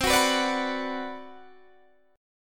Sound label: B Minor C; Bm/C strummed; JGuitar